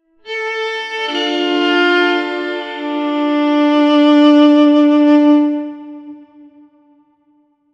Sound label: sad suspenseful